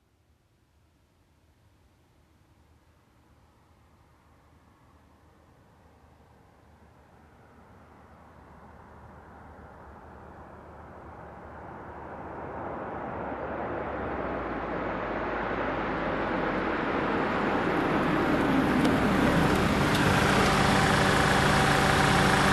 Car approaching and parking

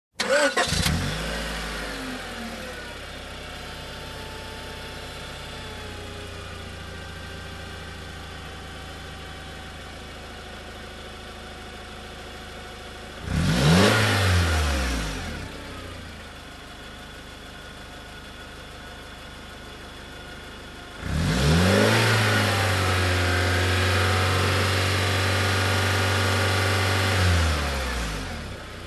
Revving up car engine

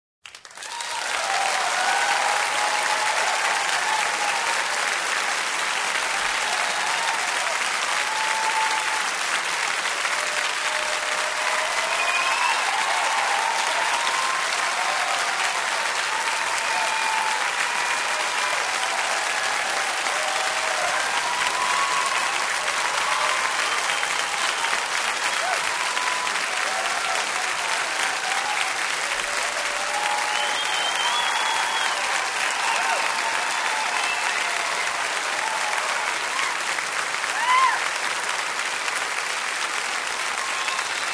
Talk Show Audience Applause

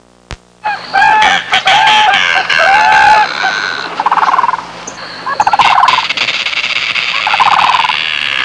Everglades bird sounds

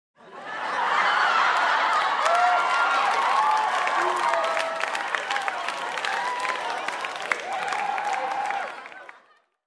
Audience Laughs and Revels

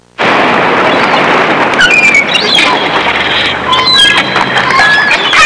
Gulls in the docks

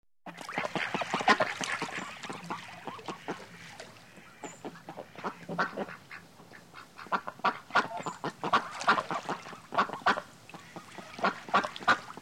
Ducks quacking on a river

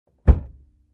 Closing Trunk dampened

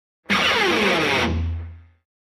Rock guitar intro slide down variant

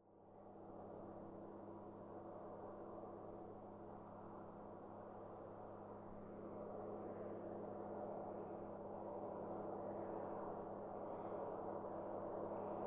Military jeep passing by

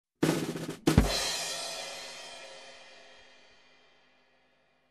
Snare Roll with ending crash

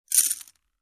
Poker Chips Stacking

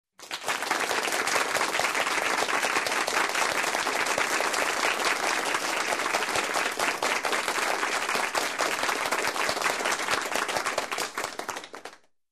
After Performance Applause

